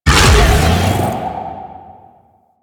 Howl Attack; Warframe; Warframes; Voruna

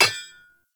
Ambient Blacksmith Hammer Impact; Sprout Farmers; Midrath

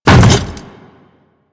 Hammer Boss Step Default, Footsteps

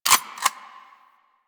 Bronco Fire Mech 2D; Warframe; Weapons

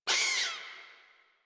Forest Rodent Vocal Pain; Warframe

